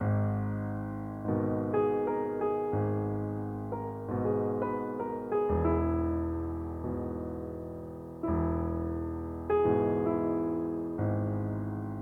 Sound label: Menu theme Melody